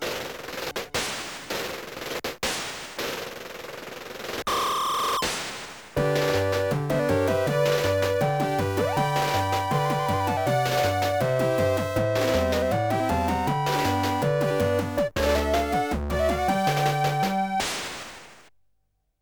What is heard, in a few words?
Goal; konami game music volume 2